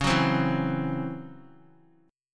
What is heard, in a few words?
C sharp Minor Major 7th Double Flat 5th Guitar; C#mM7bb5; strummed; JGuitar